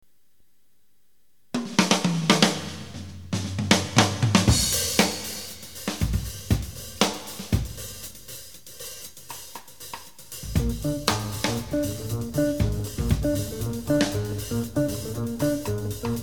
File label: kind of blues